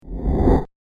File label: 8-Bit Whoosh; sound design; whoosh sound effect